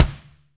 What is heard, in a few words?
BD, Roland; Sound & Vision - Gigapack I